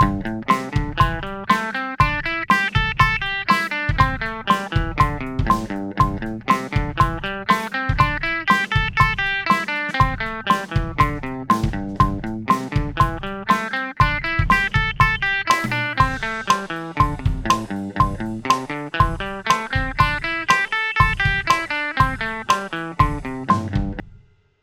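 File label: A minor #5 120 bpm eighth, guitar; A Minor Pentatonics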